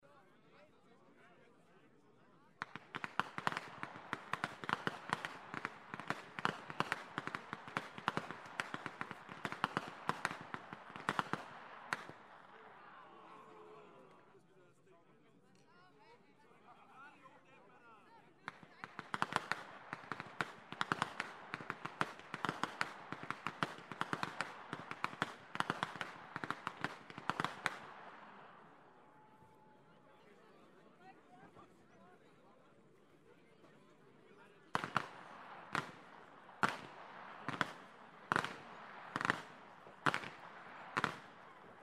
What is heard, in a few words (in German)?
schnalzen, flachgau; ST. GEORGEN II